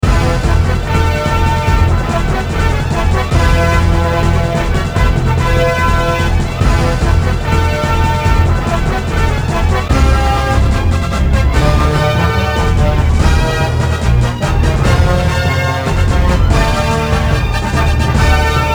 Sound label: High Score; Medieval Madness; pinball music